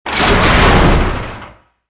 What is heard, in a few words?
door large; movers